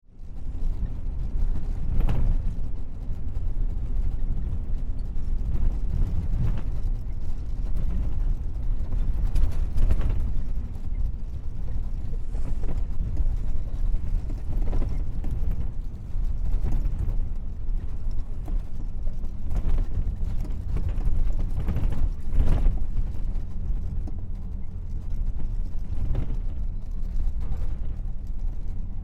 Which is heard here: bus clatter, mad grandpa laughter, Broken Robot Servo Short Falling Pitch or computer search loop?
bus clatter